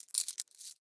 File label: Foley Coin Hand Movement Multiple